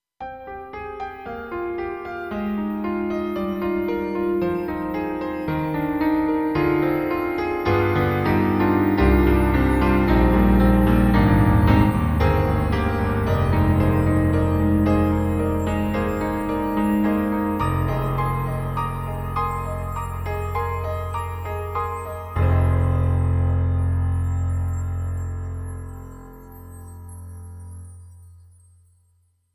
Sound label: Daydream Piano; KORG M3 XPanded Program